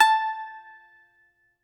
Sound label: BAGLAMA, Plucked Strings